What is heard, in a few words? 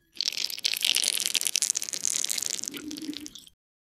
sticky gooey cheese stret, cartoon